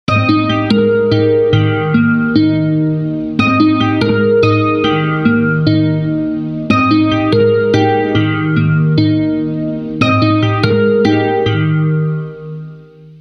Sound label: Pure Guitar FX 2 Amp, ringtones; Alcatel 5049W T-Mobile REVVL